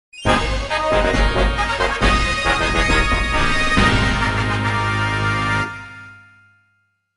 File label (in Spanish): Tema de victoria; Link; SSB